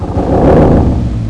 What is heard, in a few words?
DRAGON